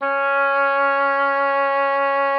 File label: Oboe, C#4; Xsample VOL-6